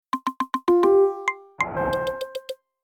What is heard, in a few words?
Audio-Logo